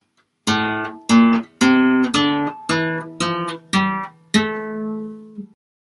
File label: phryg scale